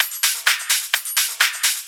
Hihat Loop 128 BPM

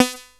SEQUENCE, K1 KAWAI; East West; Global Sounds

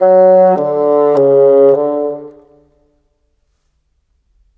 Bassoons, Cinematic; Sonic Foundry (Sony Creative Software); Orchestral Series VOL-3 - Cinematic

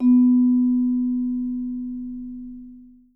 B3, Percussion & Harp; Miroslav Vitous

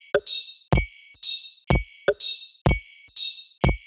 Top 124bpm, House Drumloops; Zero-G House Fabrik Drumloops

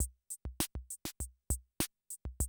CR78, DrumLoops

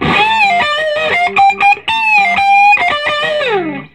SOLO, Vintage Blues Guitar

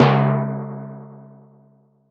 Timpani, Percussion

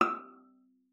viola pizz E6; Xsample VOL-5

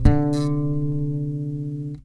DRY BASS, Electric Basses

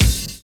BIG ROOM; Univers Sons - Kitch 70s

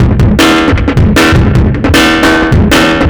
Bouncing Disto Break, Drum Loops

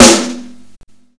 Snare Warm, Drum and Bass; EZ Rollers; Instrument Samples

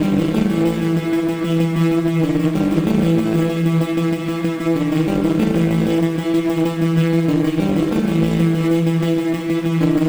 Sequence, Drums & Drones

